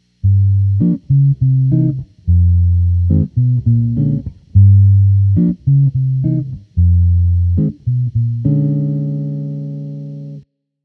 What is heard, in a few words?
dark